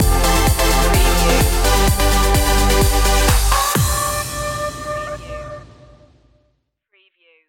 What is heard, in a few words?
trance elevation stinger